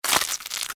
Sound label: Alien Chew Eat, Scifi, Alien, Chew, Eat, Slimy, Wet, Science, Fiction